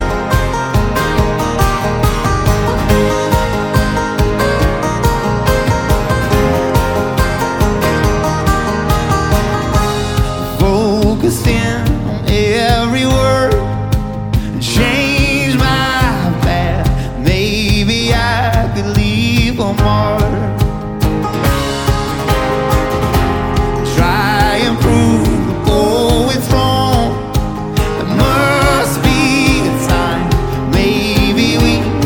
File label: Acoustic Live